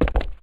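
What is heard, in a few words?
gritty cement land, footsteps; chief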